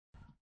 Baseball Hit, Bat hitting a baseball; Baseball Hit 1; Sports

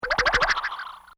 Comedy Effect Spring; Funny Sounds

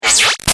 Laser Dirty Beep; Sound Design; Lasers; Big Room Sound

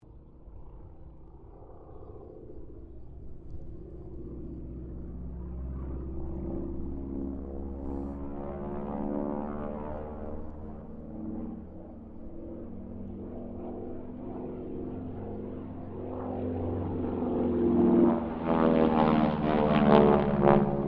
Harvard squadron 4 pass, stereo

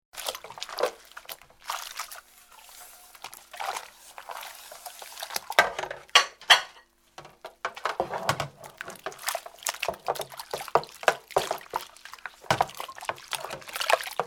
Washing Dishes, Washing up dishes in soapy water; Kitchen; Household

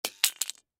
Steak Knife Drop Cement; Drops; Household; Objects; Blastwave

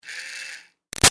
Toy Fairy Wand Med Far Run Short; Miscellaneous Toy Sound Effects; Big Room Sound

